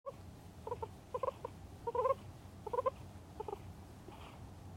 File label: purring, モルは撫でられた際に、250 Hz程度の高さの音声を繰り返し発声していました。; 撫でられているときは抵抗することもなく体全体・手足を伸ばし頭を傾け時には目を閉じ、気持ちよさそうに見えました。; 5歳3ヶ月16日; guinea pig